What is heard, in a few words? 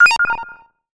Hitsound electro